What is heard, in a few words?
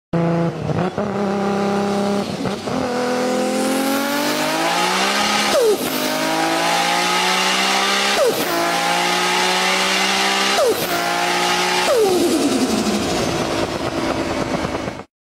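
Nissan Skyline, car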